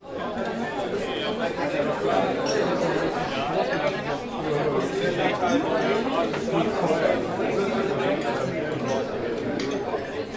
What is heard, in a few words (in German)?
balkon